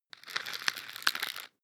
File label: floss pull out; haus-garten; badezimmer